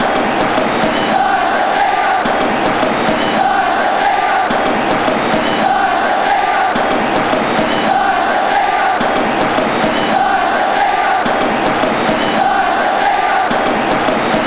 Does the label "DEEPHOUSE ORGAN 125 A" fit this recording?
No